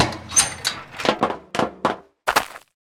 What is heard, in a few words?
Tank Get Out